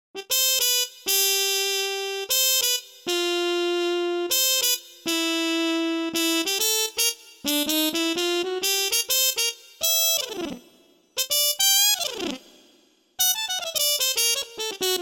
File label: Muted Trumpet